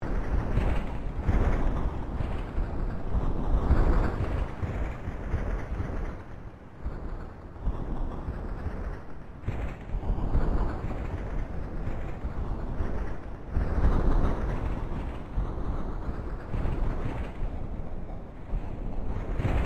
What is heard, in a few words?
Created ambience freightcar thunder loop; Various Ambience created through sound manipulation in FMOD; Artificial